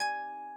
harp

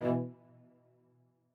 strings

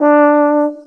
Euphonium D5; 音MAD; 吹响吧！上低音号

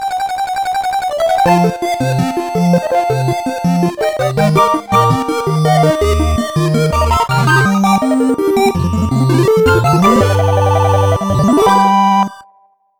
Train; 主播女孩重度依赖

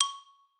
xylo

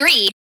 vox

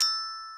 gloken

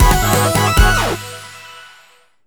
JINGLE MAP ENCOUNT; maimai

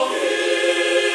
choir

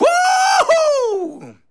SE GAME BREAK; maimai

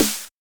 snare

